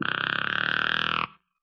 farty; Loops; Electronic

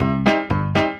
piano polka, Phonky loops

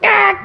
ah

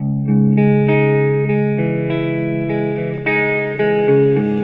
guitar, Sad

